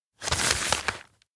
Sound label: map unfold; awayuki; anemoi パッケージ版